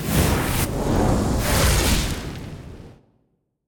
multiplier wheel display win; arcane

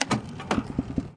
quick Spin Button Click; jack and beanstalk

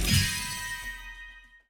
scatter win; turn your fortune

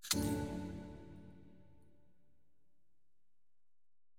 spin Button Quick Stop; fairyhansel

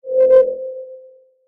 bet level glow; turn your fortune

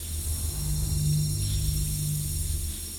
mega win loop; arcane

